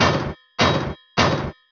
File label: Paladin shield buff